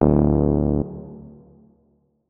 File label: notes, keyboard, electric piano